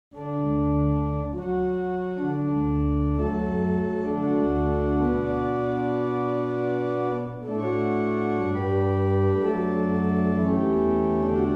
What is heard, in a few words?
Darwalls 148th, barrel organs; Meldreth